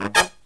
low chord, brass riffs

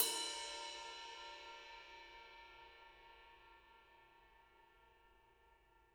RIDE, RIDE CYMBALS; Spectrasonics - Backbeat

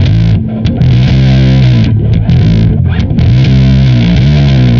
Bass, Distorted; Bass X

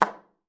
SLPB F3, ALTOSAX; Xsample VOL-2

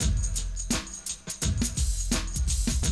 Classic DrumLoops

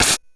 Drums, Hits; DCM8

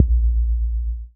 BD, BASS DRUMS; EdgeSounds

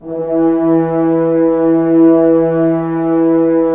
Horns; Roland - LA Composer vol. 4; HZ Horns

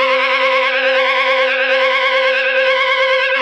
Cigar Feedback 140 C, guitar drone samples 140bpm

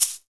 shaker, percussion

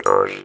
MT G, JHARP G B; Xsample VOL-9

